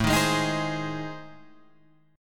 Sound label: A Minor Major 7th Flat 5th, Guitar Drop D